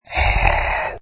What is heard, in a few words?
Monster Animal Sneer; Monster sneer: Short snarl or growing sneer from large fantasy animal or horror creature; Lynne Music